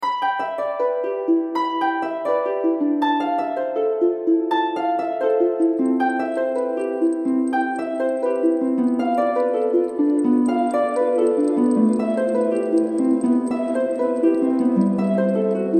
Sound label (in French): harpe; FantasmesFilm